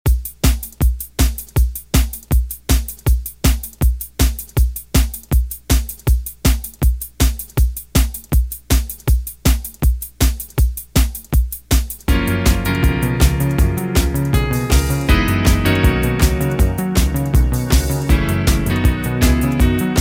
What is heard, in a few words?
80s Dj Intro Outro 160Bpm